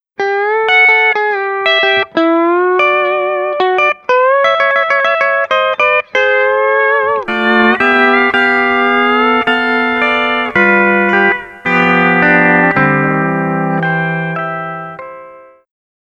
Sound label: Pedal Steel Guitar